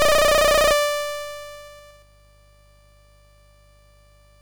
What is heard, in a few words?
sid MOS6581R4; Movie Monster Game